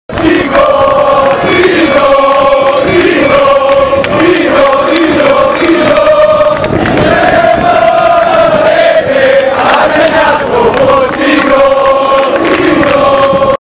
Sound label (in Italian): dindon, cori